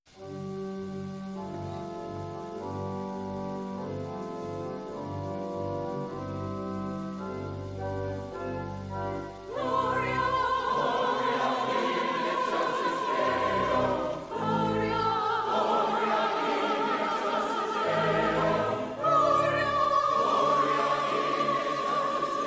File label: Fanfare